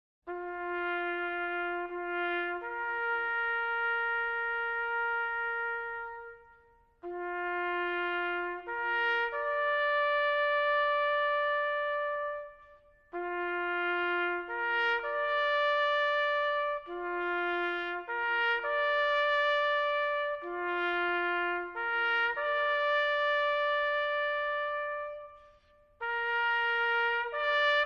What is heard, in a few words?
Taps Low Volume; D Troop,14th Armored Cavalry Regiment — Fulda, Germany; at 2300 (11pm)